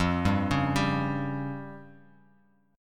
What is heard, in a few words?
F Suspended 2nd Sharp 5th F; Fsus2#5 arpeggiated; JGuitar